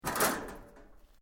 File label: METAL LIGHT CRASH; Materials